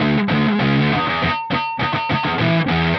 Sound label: Riffing In Five, Rock, Guitars